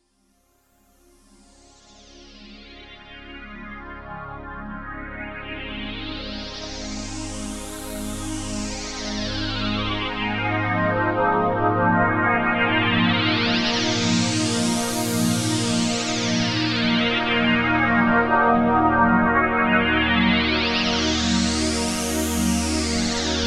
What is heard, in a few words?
Alpha Juno 2 Filter Sweeps